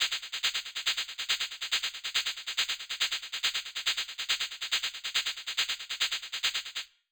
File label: horror Shakey; Green Vineria; Incredibox mod Sprunki